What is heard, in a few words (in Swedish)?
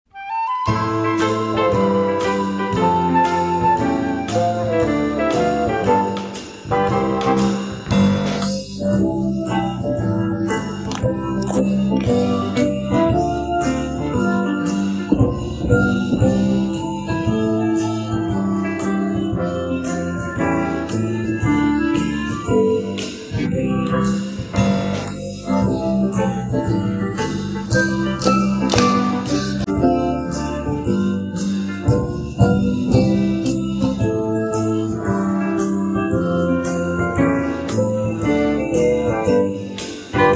KLAPPA STAMPA SNURRA Music